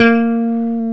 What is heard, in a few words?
E Bass Hamr; Club-50 - Foundations Roland